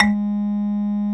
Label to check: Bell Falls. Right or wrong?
Wrong